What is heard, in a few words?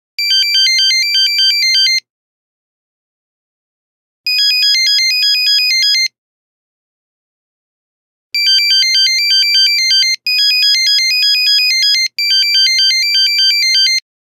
Samba rumble, Nokia Buzzer; Windows Phone apps